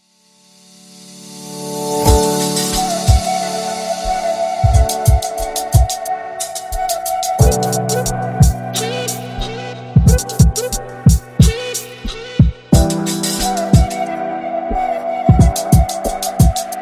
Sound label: Love Hip Hop